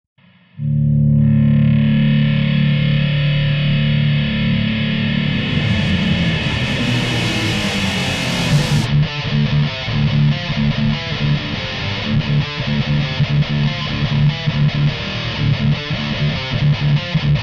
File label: riff